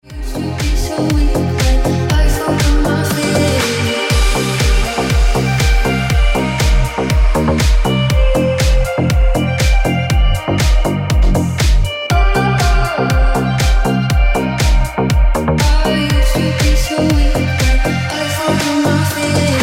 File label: Ringtone